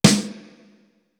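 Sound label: AMBIENT SNARE; East West; Global Sounds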